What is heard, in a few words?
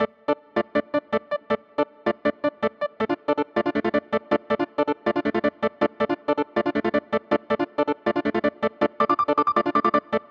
firebattle